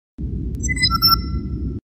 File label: Door Chime; TNG